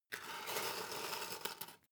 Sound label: paperclips pick up slide